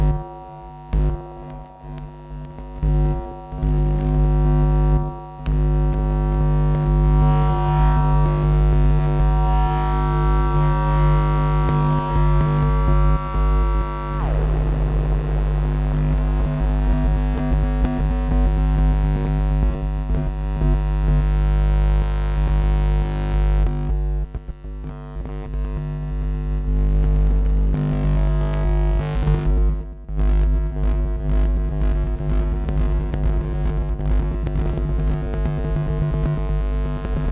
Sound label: cable noise